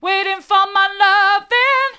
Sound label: waiting for my loving hard, vocal